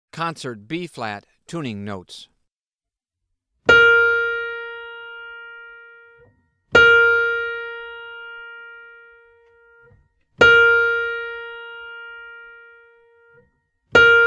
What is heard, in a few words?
Tuning Notes; James „Jamey“ Aebersold Play-Alongs